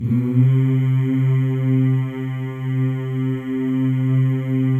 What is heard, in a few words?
male; Spectrasonics; Symphony of Voices